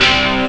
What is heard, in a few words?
Power Fifth; Amiga Soundtracker Sample Packs